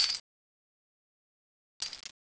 idle, weapons

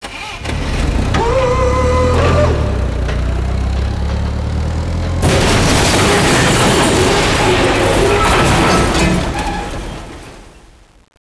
forklift; ambience

